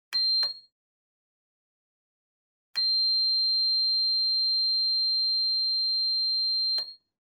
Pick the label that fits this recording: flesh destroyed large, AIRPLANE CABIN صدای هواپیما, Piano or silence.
AIRPLANE CABIN صدای هواپیما